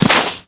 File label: land; vortex; sonicnew